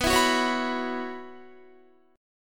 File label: B Suspended 2nd Suspended 4th, Guitar; strummed; 4E,4A,5D,5G,5C,6F; 19,19,16,18,18,18